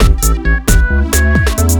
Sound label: 133 MIX, House Side Of 2 Step; USB Soundscan vol.51; AKAI; 2 STPS